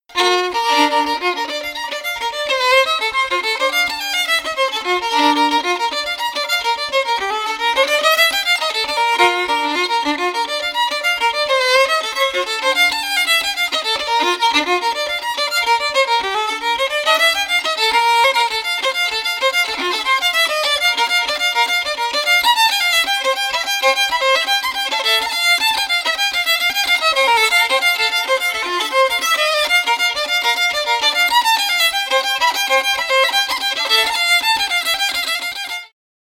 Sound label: fiddle; high level; SeamusGrantFestival